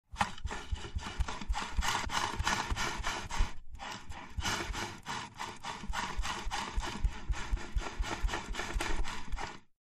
Fast Trackball Spins, mouse

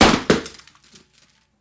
Glass Berry Jar Drop; bottles

